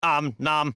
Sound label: om nom; monodam